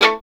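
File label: STR violin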